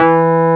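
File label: WURLY NO, Wurlitzer electric piano; Vintage; Sonic Reality; Vintage Timetraveler; WURLITZ EP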